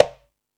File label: Latin Drums, E-MU Pro-Cussion